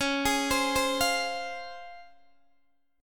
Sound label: C sharp Major 7th C sharp Guitar DADGAD